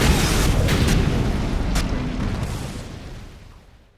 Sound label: explosion; raydium